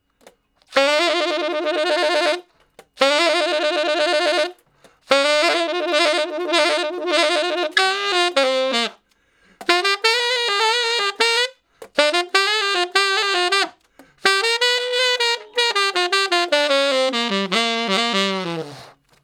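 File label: Tenor Sax Shuffle (G); Solos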